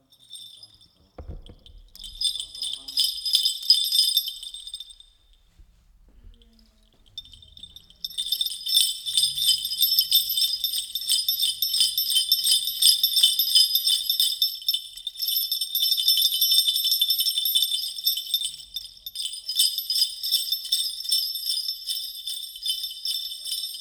Horse Bells

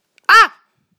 Pain Scream Girl

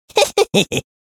Laugh Giggle Man Witch Cartoon

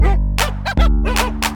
Title Music

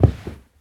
Body Falling On Carpeted Floor

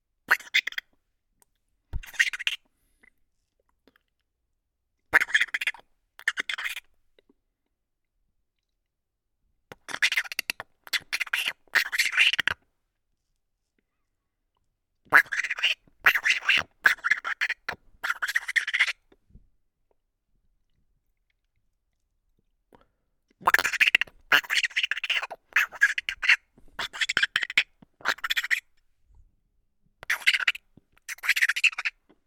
Chipmunk Vocalization

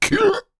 Zombie Pain

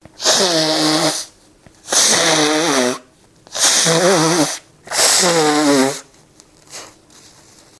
Nose Blowing

